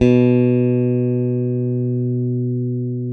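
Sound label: CLN STRAT, Synclavier, GUITARS; Sampler Library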